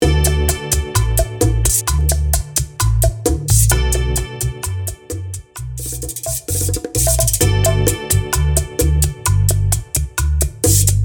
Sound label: Intro Outro 130 BPM